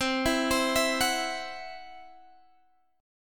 C Major Flat 5th, C, down, Guitar, Standard; arpeggiated; CMb5

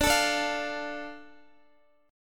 D Minor D Guitar; strummed; x,x,12,14,14,12; 4E,4A,5D,5G,5C,6F

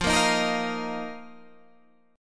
Eb Suspended 2nd, F

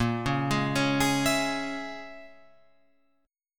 A sharp Minor 7th Flat 5th, Guitar Open F chord; arpeggiated; 10,8,8,8,11,11

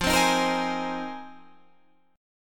Ab 6th Add 9th F

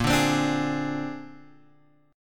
A sharp 9th A sharp, Guitar, Open G; 8,7,10,7,9,x

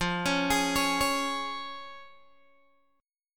F Minor Sharp 5th Guitar DADGAD; Fm#5; 15,16,18,18,16,x; arpeggiated

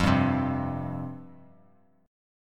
F Suspended 2nd, chord; Fsus2 strummed; x,8,5,5

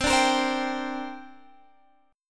C 7th Suspended 2nd, C, down; C7sus2; strummed

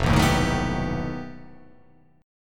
F 9th Flat 5th F down

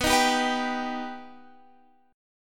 B Augmented B Guitar Half Step Down

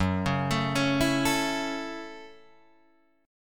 F sharp Minor 7th F sharp, Guitar Open D

